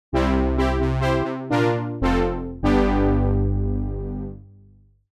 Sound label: OBX soft brass; opxpro3